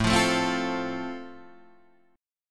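A Minor Sharp 5th, Guitar Half Step Down, chord strum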